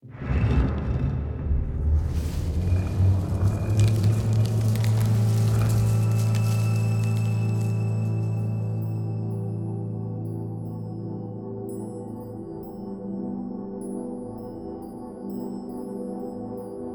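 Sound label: trees grow sequence D sustain; lvl trees chunk3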